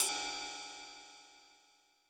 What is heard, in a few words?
Ride D#2, Roland U220